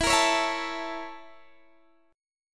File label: Bb Minor Major 7th Flat 5th